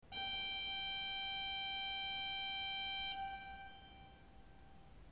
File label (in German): ton, orgel